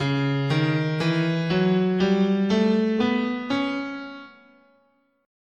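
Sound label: D flat Locrian sharp 2, guitar, scale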